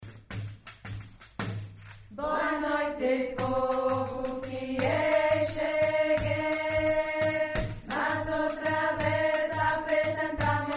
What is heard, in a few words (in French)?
Baiana voix medium